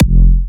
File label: Hitter F4, Big Room Kicks